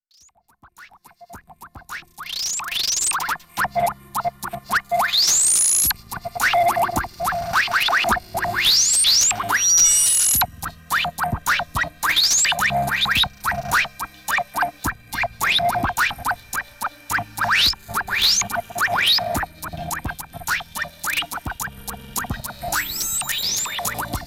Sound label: Rua da Alfandega, Lisbon